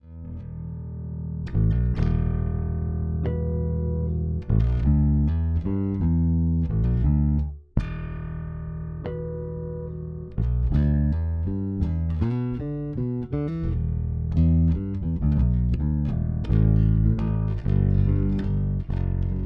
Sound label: Marquess Bass